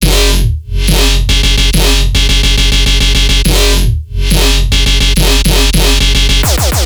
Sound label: Riddim Bass Loop F# 140; Alltron; Ghosthack; Riddim Essentials Volume 2